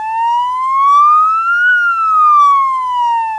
Siren Slow; LFS

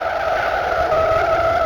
Skid Road Heavy Major; LFS

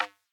click, alerts; gnome

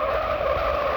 Skid Road Heavy Minor; LFS

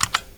key press; osu!; skins! PRE RELEASE

